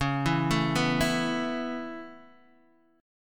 Db Minor 7th Sharp 5th, Guitar, Open D

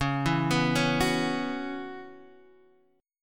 C sharp Minor 11th C sharp

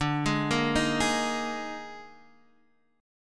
Eb Major 7th Suspended 4th D

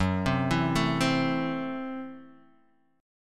Gb Minor 7th Flat 5th Gb Guitar Standard; arpeggiated; 2,3,2,2,1,x; JGuitar

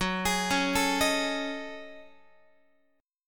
F sharp Minor 6th Add 9th, Guitar; F#m6add9 arpeggiated; x,14,11,11,13,14

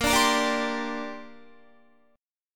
A sharp 6th Add 9th, Guitar; A#6add9; strummed

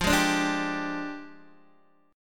F Minor 6th Add 9th F down Guitar DADGAD

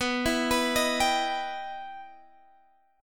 E Minor Major 7th B

